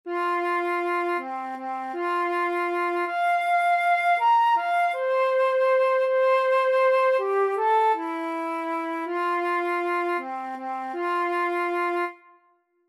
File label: Intervals fourths flute